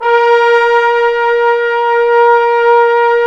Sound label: BRS TBNS F, Bone Sec.; Roland - Brass, Strings, Hits and Combos; Bone Sec.3